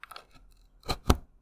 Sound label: plug in USB